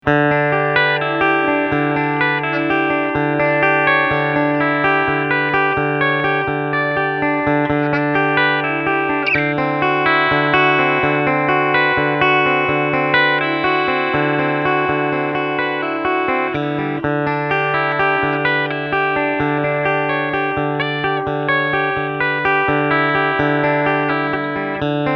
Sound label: Fender AM Acoustasonic Strat